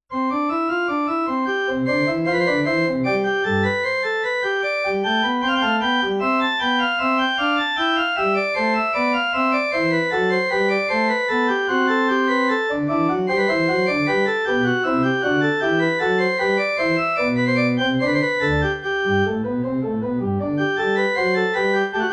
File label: casio px 200; dobra klawiatura